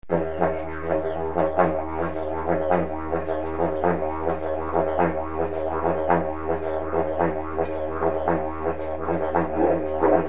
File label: short solo